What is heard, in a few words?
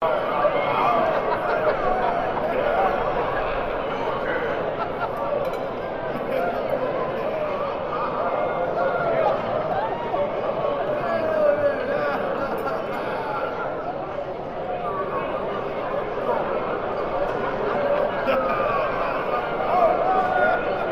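AMBIENCE BED Walla Crowd Banquet Hall Dinner Mono loop; gamerip; The Unfinished Swan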